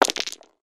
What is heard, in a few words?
DICE